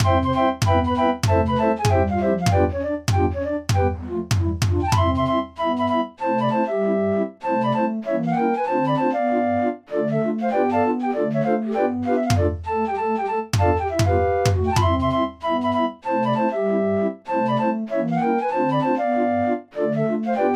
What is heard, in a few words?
draaiorgels